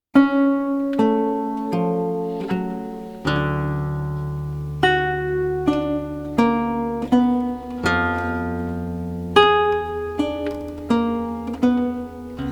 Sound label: Lute Suite in G Minor, Sarabande; BWV 995 - IV.; Bach; JS Bach - Complete Music for Lute